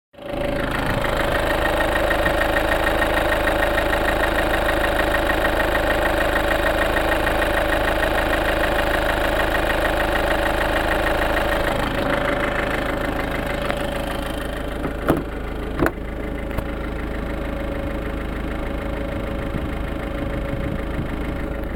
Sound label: whining noise ish engine area, volvo; volvo v50